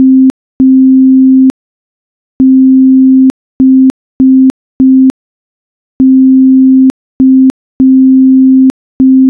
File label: morse abc